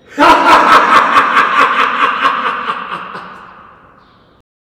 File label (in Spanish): Risa